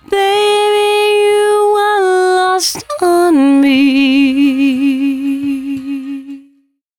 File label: 113bpm C#, Vocal; Velvet Vocal; Zero-G